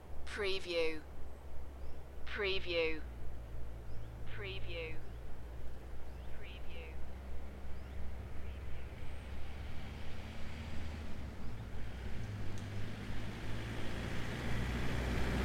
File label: Welsh Forest ambiance distant cars and drive by; beanstalk audio